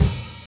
OHAT; East West; Funky Ass Loops

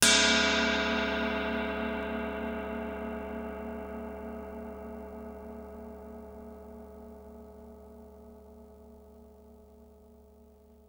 Cymbal; 90 sSampleCDs; Coldcut's Kleptomania VOL1; Drum One Shots

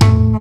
TOM FX; Ragga & Dub Reggae; TOMS KIT; USB Soundscan vol.27; AKAI

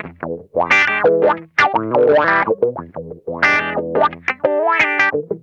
CROAKIN, Funk Guitar; Zero G - Funk Guitar

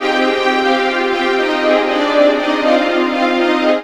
BAC, MUSICMOTIVES

